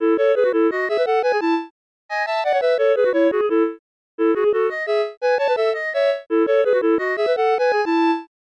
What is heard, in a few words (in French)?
poemes musicales